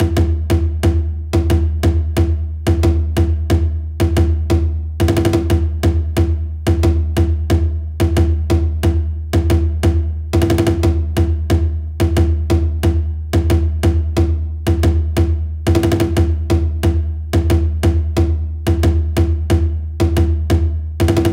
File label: Bodhran - 90BPM; Percussion Kits; STEMS; Ghosthack; SkyRealm; Kit 13 - Leap Of Faith